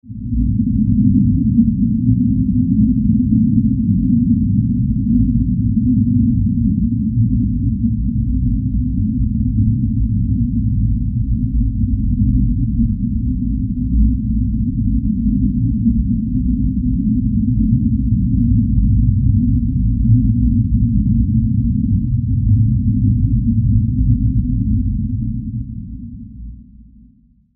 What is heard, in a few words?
scifi atmo